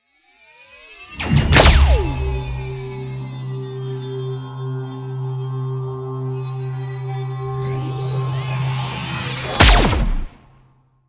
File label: promo pad; whooshes-rises